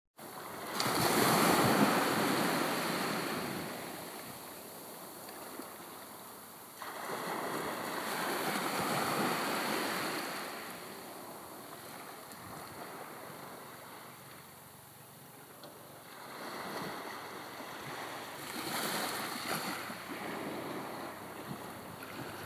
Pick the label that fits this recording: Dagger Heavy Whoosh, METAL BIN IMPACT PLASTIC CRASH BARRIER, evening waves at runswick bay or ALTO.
evening waves at runswick bay